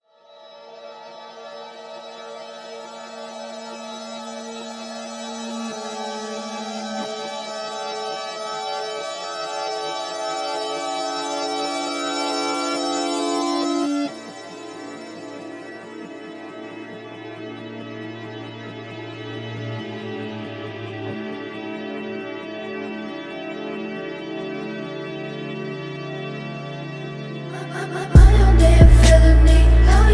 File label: urban melody speed up